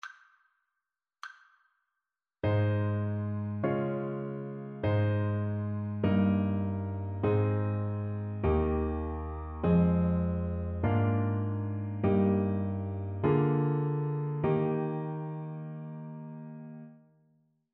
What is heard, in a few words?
harmonic dictation